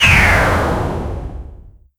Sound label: short soft missile launch; explosive tail